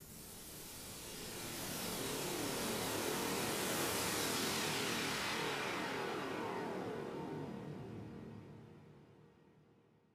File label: 134BPM Noize Fx; Vengeance Samples; Lovely Day Kit; Sound FX